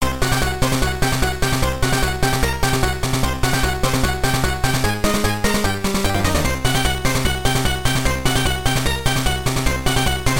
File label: Boss Theme (Techno); Asterix Search for Dogmatix; Boss Theme 1 (Techno Roman)